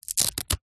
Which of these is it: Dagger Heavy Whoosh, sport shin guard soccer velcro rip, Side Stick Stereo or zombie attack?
sport shin guard soccer velcro rip